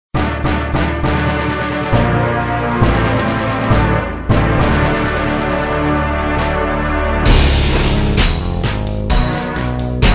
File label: victory